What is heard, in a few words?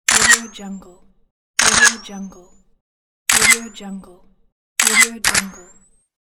camera flash